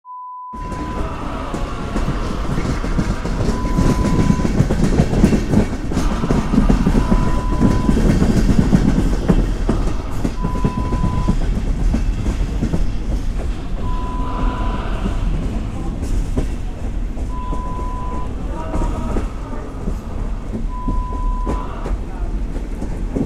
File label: train external sound